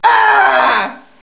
die; payned

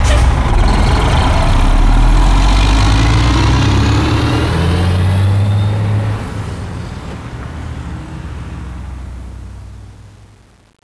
truck drive off

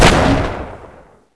shoot, weapons

